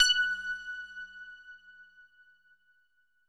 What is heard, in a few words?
F#5, SYNTH WAVES; Music Store Sound Service; Einstein